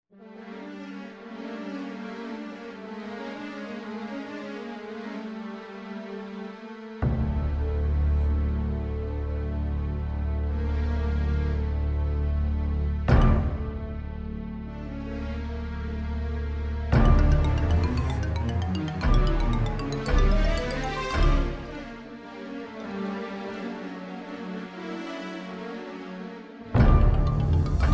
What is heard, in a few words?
Soundtrack Demos Heavy Tension Psychotic Chase Scene